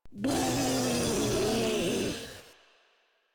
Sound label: Infested Ancient Vocal Attack